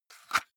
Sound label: cassette case insert soft; objekte; kassette